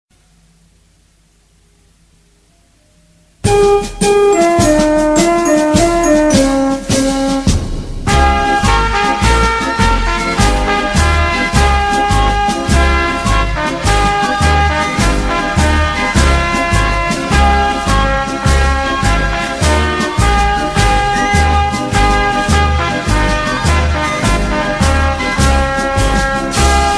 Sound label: beat